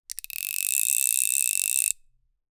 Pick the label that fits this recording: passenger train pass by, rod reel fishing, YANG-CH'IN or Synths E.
rod reel fishing